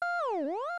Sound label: goal iris out; smw